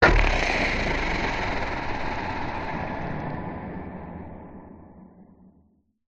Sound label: horror monster grown sudden; zapsplat